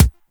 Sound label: Weapon Punch Pistol; Weapons; Pistols; Warframe